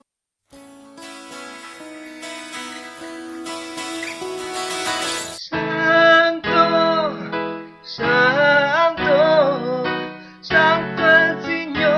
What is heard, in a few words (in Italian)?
santo tenori